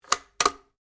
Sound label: RIFLE ANSCHUTZ 67MSR 22 CALIBRE BOLT ACTION CLOSED; A selection of domesttic firearms; Rifles